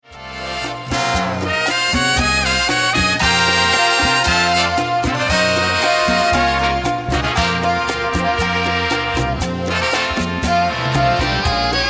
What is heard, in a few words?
city rhythm cha cha